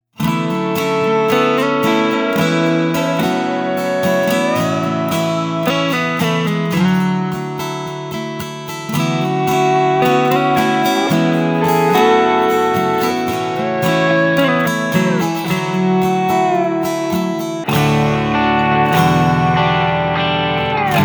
Nashville Guitars

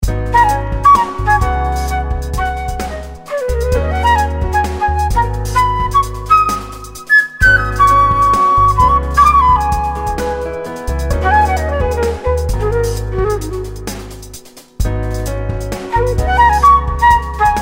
Solo Jazz Flute

